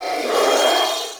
Sentient Symbilyst Pain, Barks, Enemies; Warframe

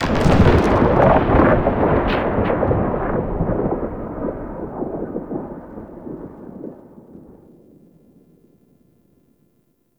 Paratrooper Plane Fly Over Start; Enemies; Gameplay; Warframe; Lasrian Soldiers

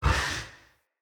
Camel Idle Breath; Khaban Camel Vendor; Vocalizations

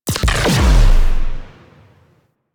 Guided Missile Fire; Vehicles; Crew Ships; Weapons; Warframe; Rail Jack; Corpus Crew Ship

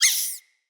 Floppet Single Squeak, Animals, Vocals

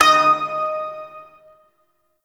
Shawzin Oct Three D Sharp; Warframe

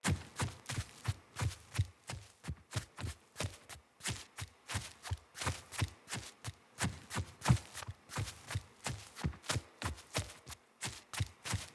Pack Wolf Steps Jog Loop; Animals; Footsteps

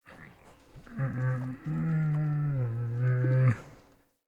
Wolf Idle Friendly Moan, Animals Wolf Vocals

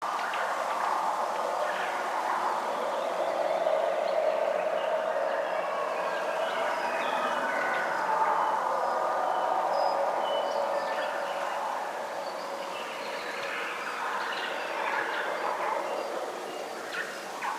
Day Amb Loop, Ambience, Glades; Glades Of Andurin

